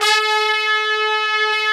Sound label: BRS TPTS, Brass Sections; Roland LCDP06 Brass Sections; Tpts Sec.1